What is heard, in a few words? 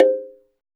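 SLIT DRUM C5 F; SLIT DRM1; Heart of Africa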